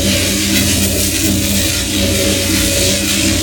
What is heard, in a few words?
stone Sparkle; gorillakingdom